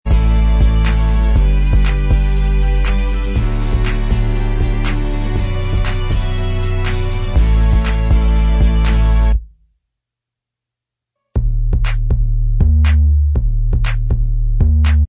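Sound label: Instrumental Track, backing tracks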